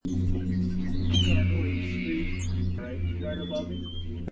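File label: Red-tailed Hawk scream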